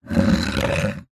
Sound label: sport cricket bat scrape on hard ground; zapsplat